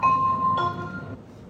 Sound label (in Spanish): ascensor strong